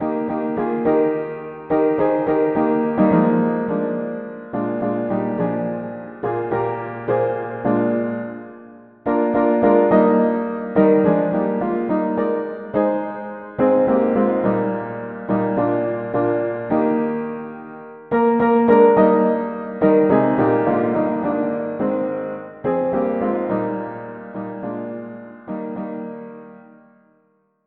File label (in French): Invoque moi mono piano